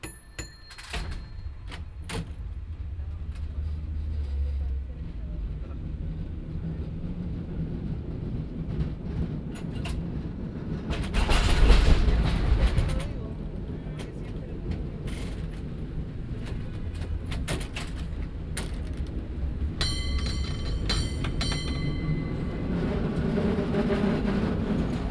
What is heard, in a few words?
Cable Car One Stop Montage; San Francisco